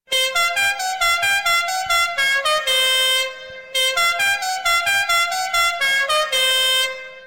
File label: Horn